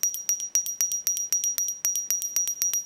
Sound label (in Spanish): campana, mybells